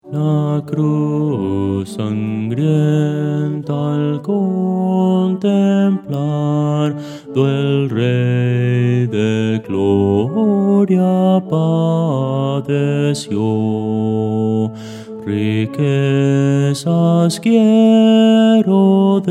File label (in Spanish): bajo; Voces para coro